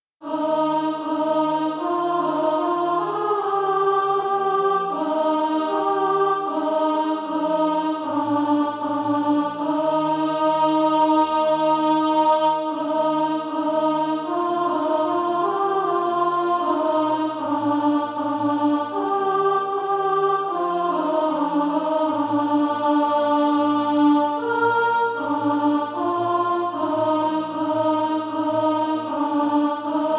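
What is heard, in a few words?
alt, Practise Music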